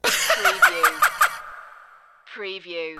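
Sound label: Crazy Laugh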